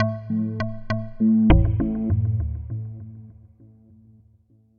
Fall And Bounce Arp 100bpm A1

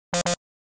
Keycard Denial

